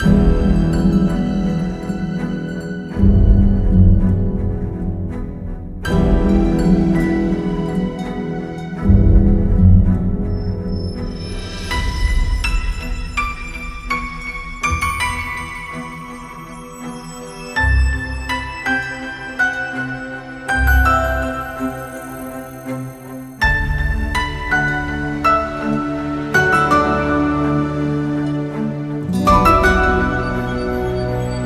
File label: JRPG Theme